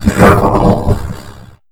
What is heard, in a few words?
sleep death; sleeper